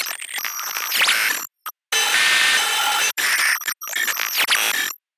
Glitch; Kyutatsuki

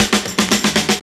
120 fills; Sound & Vision - Gigapack I

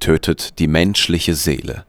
vox; Ueberschall; Techno Trance Essentials

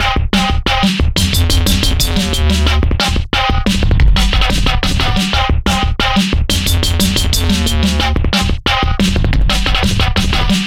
stix draw quick 090bpm, downbeat dance grooves; Zero-G

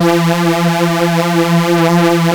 Korg PS3100 E 3; Back In Time Records; Korg Universe VOL-1

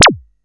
ZAP KIT C4; USB Soundscan vol.20 - Fresh Disco House I

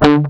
GUI Pick Damp, GUIT; Best Service Gigapack 2

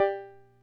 Drum, ct-24; classic machines

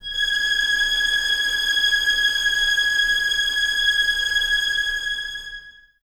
VS G#6 Tremolo, 23 Violins; Miroslav Vitous

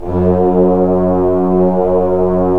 BRS Tbns; Roland - LA Composer vol. 4

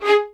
LDVLN1 ATT G, LOUD VIOLINS; Q Up Arts; Denny Jaeger Master Violin Library

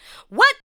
ADLIBS

